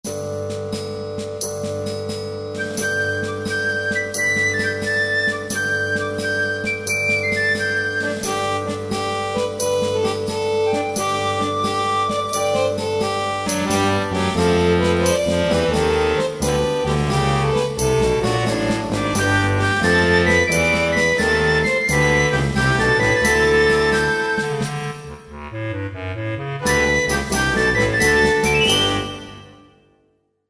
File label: i saw three ships